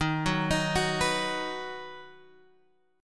D sharp Minor Sharp 5th D sharp down Guitar Open G